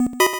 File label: 8bit se